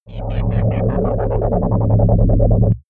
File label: marine propeller; bewegung; comedy-cartoon; soundpool